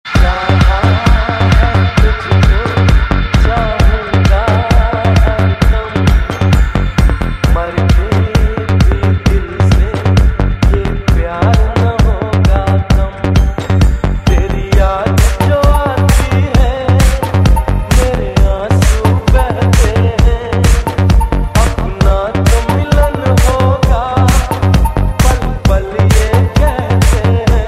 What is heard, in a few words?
Dj Electro